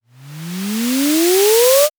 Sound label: riser organic